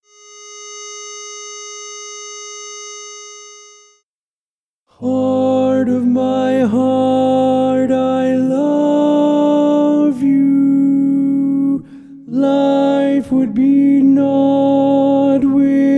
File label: Tenor